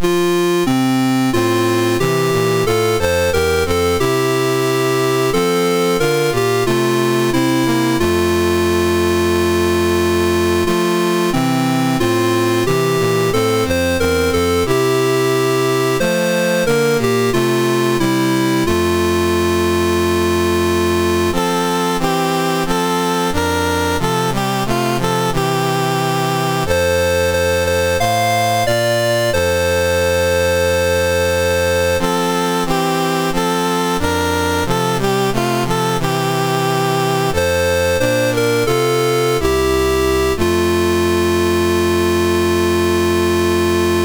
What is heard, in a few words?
Malawi, National Anthems; MOS6581R3; Dave Marquis